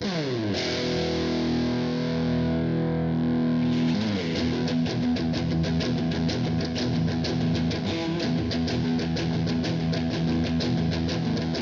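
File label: just guitar in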